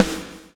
BLOW; Spectrasonics - Backbeat

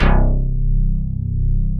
OBDX FM BS; Synclavier Sampler Library; KEYS AND GUITARS

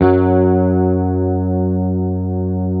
Hamer 12, SUS, F#2, Bass; Sampleheads; Will Lee Bass Library

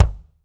BD, Drums; Bob Clearmountain Drums I

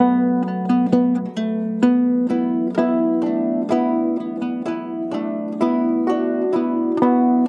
Traditional Guzheng; Asia; Continental Drift Aftershocks

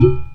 Udu Slap-Rel Lo

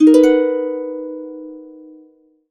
HC BMAJ7, Complete Harps; USB Soundscan vol.62; AKAI

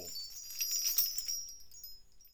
BELLTREE; ILIO; Synclavier Percussion 2; World & Orchestral

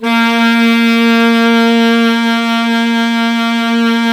SAX 2 Altos A#3; Alto Sec

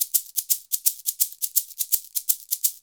SHAKER, Modern Percussion Loops

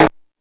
Timbale, E-MU Pro-Cussion

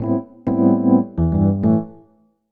Electric Piano; Dr. Fink's Funk Factory

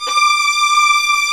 STR, Vls Agitato; Roland L-CD702

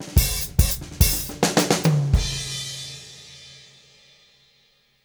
Fills 106; Zero-G; Monster Beats

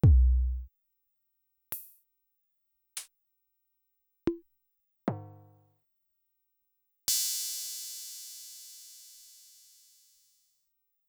Chip; Sonic-Potions LXR

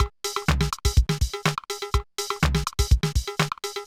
Loop, mxr; classic machines

